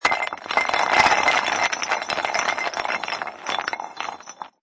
Debris Rock, Rocks, bricks, stones or ceramics being moved, disturbed.; Rattling stone debris sound.